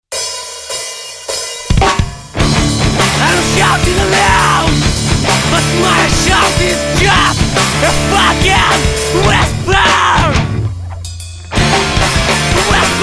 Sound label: Whisper